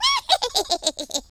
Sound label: laugh, Irish; speech